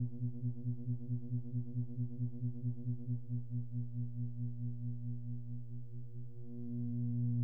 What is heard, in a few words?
hum